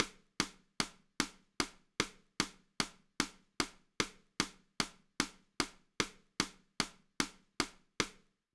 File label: rhythm track